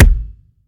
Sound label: normal hit normal; muni muni! v1.1